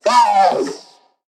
Repair Drone Death; Necramech; Battle Barks

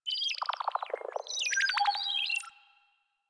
Venus Corpus Data; Ambience; Warframe; Venus Open World; Venus Landscape

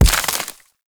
Mech Step Surface Foliage; Vehicles; Step Surfaces; Warframe; Nechro Mech

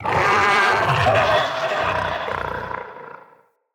Corrupted Slug Sub Boss Vocal Attack; Creatures

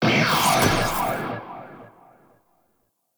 Exalted Equip 3D; Warframe; Temple

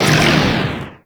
Proj Large Fire; Warframe; Lizzie

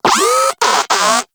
Moa Compassionate Vocal Stomp; Warframe

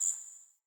Bird Single Chirp; Paragon Bird

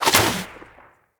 Bow Shot; Weapons; Player; DendritBow

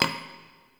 Idle Heavy Hoof Stomp; Tagfer; Warframe; Ambience; Entrati

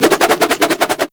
Nunchaku Quick Spin Whoosh; Warframe; Tenno

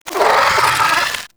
Vocal Attack; Warframe; Moa Satyr

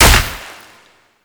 Proc Explosion; Warframe; Mods

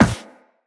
Run Metal, footsteps; Characters; Operator

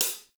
Metronome Sweet Spot Tick; Warframe; Temple

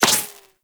Laser Impact Default; Warframe; Bullet Impacts

